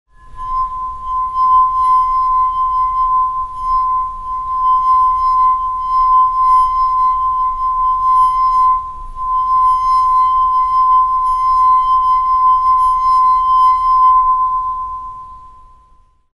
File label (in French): Verre cristal; bruitage; Liquides